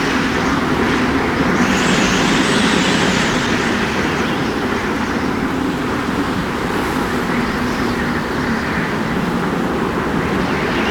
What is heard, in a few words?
whistling wind